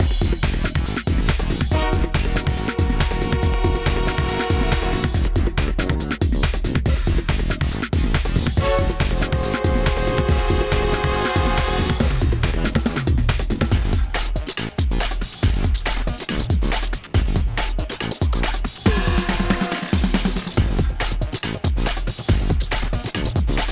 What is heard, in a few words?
Combat Loop